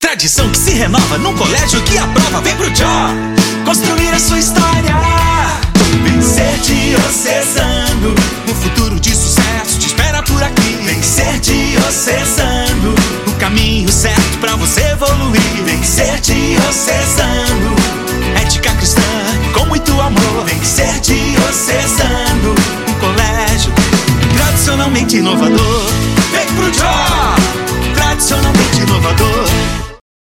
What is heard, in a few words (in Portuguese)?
jingle institucional educacao; colegio-diocesano